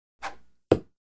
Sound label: Darts; Dart Throw With Feather Whoosh. Hits Target.